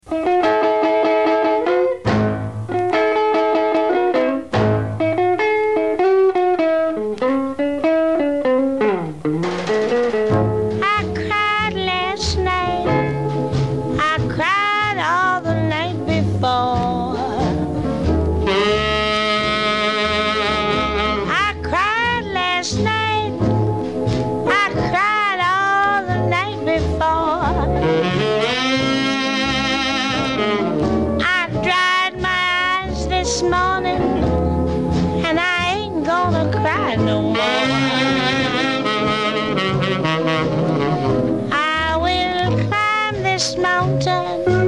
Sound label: Rocky Mountain Blues, jazz